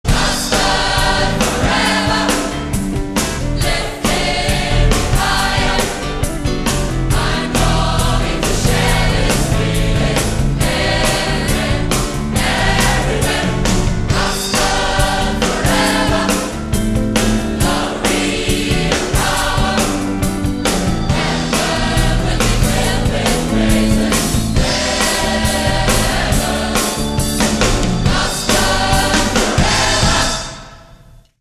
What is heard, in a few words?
gospel chorus